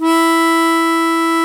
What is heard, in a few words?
HARMONICA